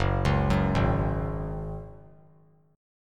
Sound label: F sharp Suspended 2nd; arpeggiated; JGuitar